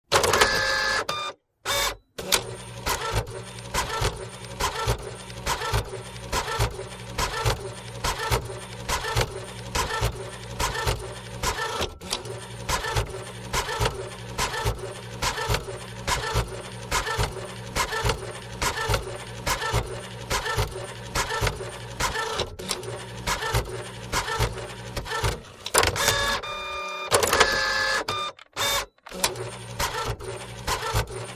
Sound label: Printer Ink Jet Print; fax scanner copier